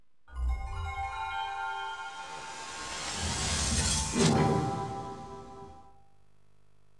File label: Sega Saturn PAL Boot